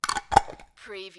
Screw lid onto a jar of jam

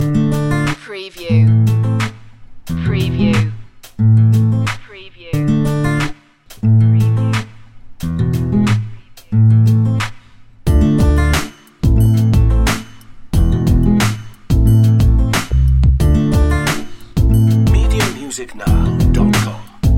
hip hop soul loop

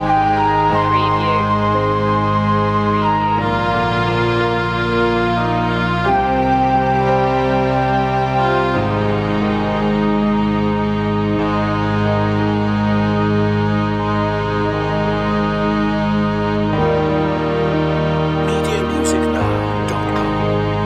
melancholic flute

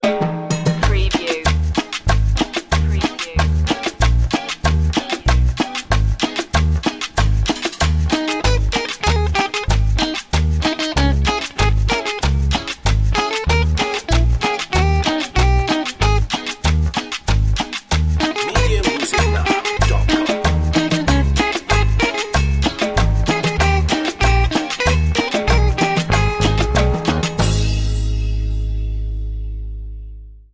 colombian party

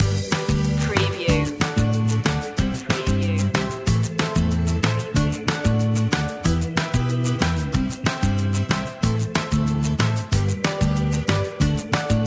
whistle beat loop

